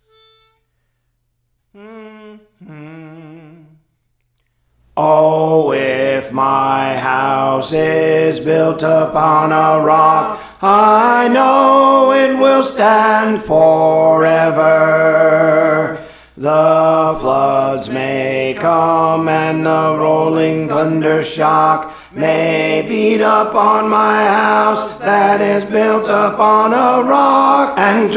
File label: Alto; Praise For The Lord Training